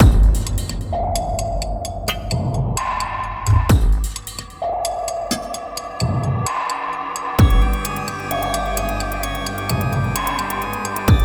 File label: Engine Music; Dr. Seuss' The Cat in the Hat; Xbox